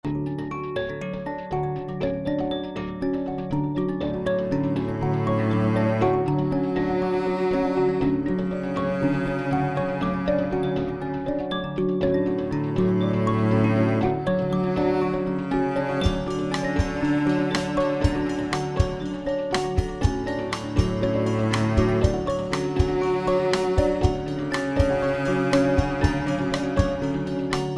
Cello Chillin

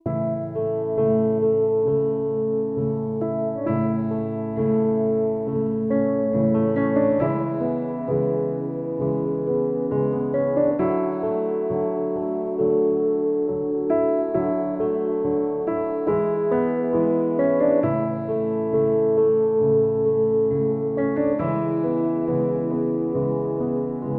Peaceful River (no cello)

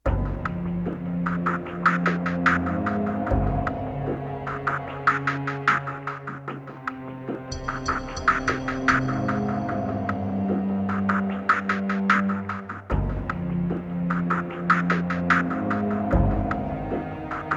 Tension Tunnel; tension mounts

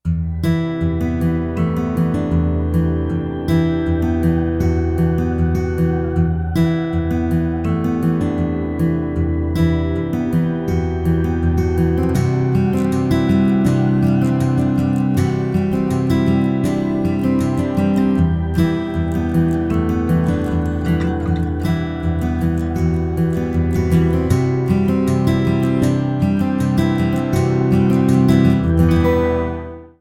Guitar Dreams

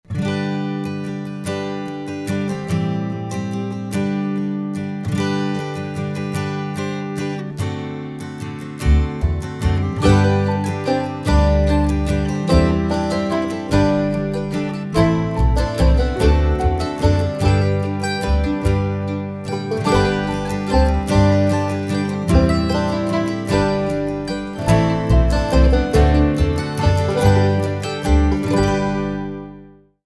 Winsome Waltz, acoustic studio

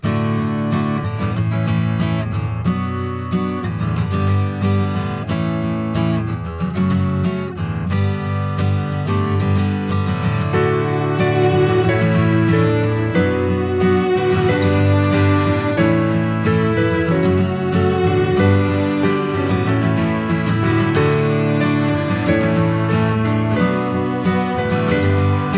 Celebrate (no drums)

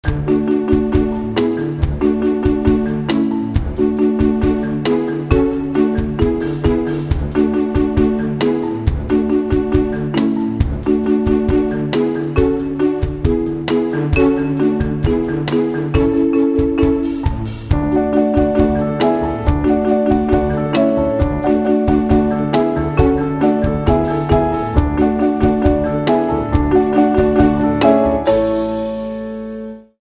Romantic Comedy

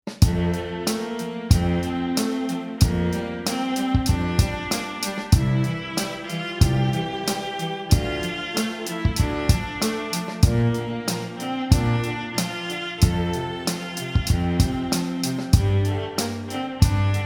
Quartet

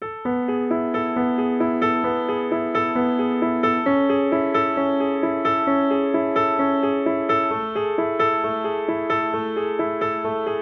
solo piano; piano poems b

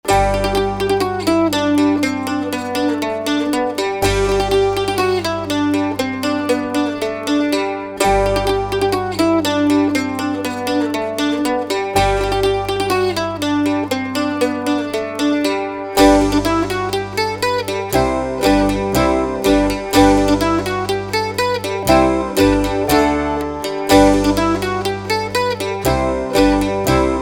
Mandolin

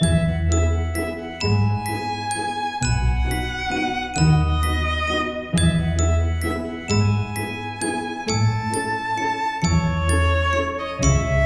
Toddler Tune; kids corner

